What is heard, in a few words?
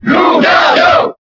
Cheer French; Lucario; SSBB